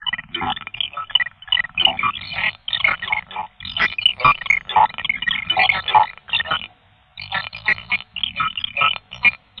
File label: Insect Voice, Textures